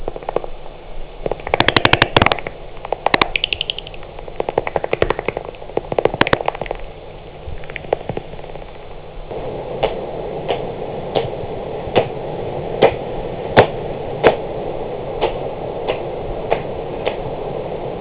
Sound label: pipistrellus pipistrellus; fauna; sismisi